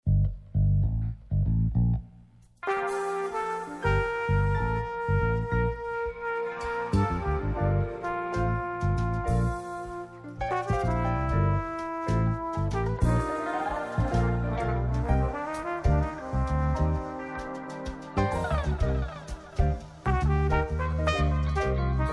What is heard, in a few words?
Flugel; Hajduk